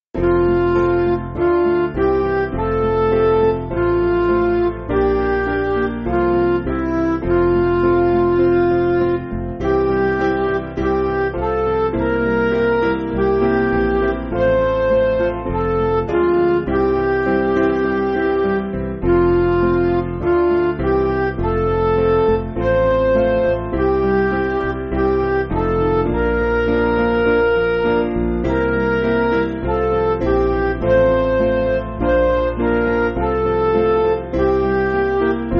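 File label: Midi, Music Backing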